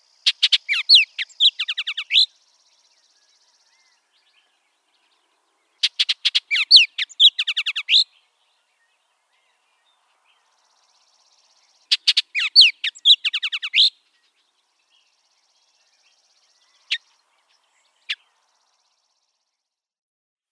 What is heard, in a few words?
Bullock's Oriole